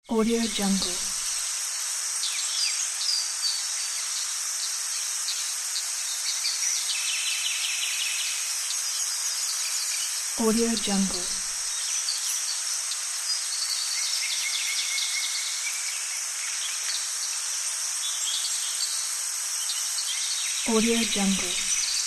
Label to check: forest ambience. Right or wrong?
Right